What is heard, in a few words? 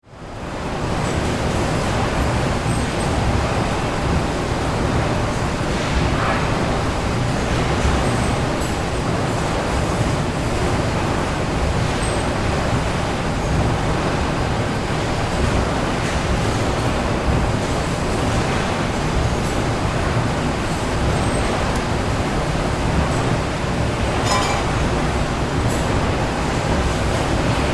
Factory Heavy, Ambience, Activity, Industry; Factory Sound Effects; Blast Wave